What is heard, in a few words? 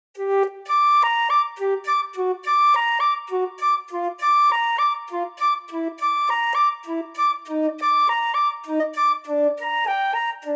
flute